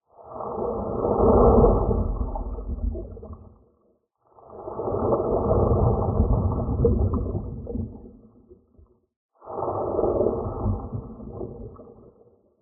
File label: underwater move swim; lukas tvrdon